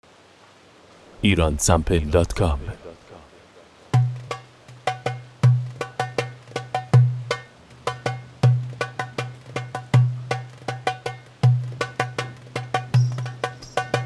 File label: Darbuka